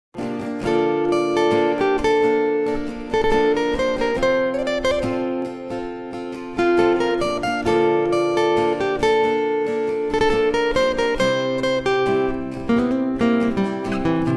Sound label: acoustic guitar solo; tabs